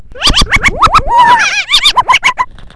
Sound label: woopedoo